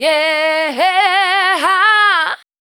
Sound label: FEMALE, Dance Vocals